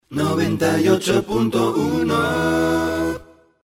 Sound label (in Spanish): cantante jingle dial, cantante del dial 98 punto 1